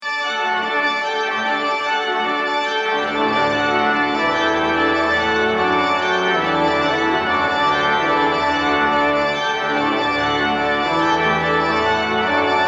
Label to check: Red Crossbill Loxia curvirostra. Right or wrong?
Wrong